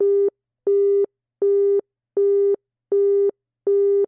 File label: busy